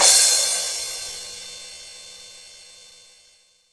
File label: Cym; Roland R-70